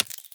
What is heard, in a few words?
step, headcrab black movement